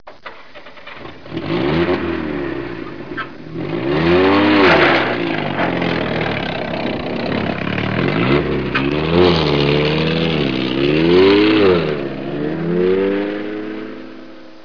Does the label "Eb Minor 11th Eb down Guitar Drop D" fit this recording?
No